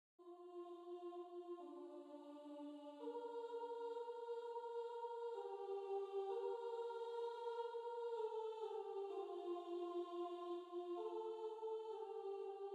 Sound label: Trio; How Great Thou Art